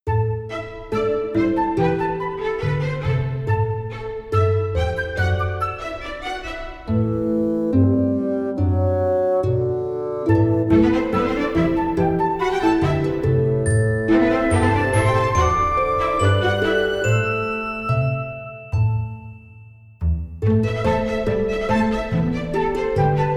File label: Synth Mix